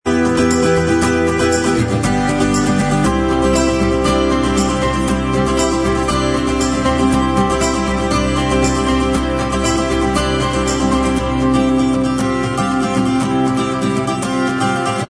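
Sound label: acoustic Instrumental Track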